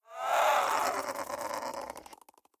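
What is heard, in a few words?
Hesperornis sound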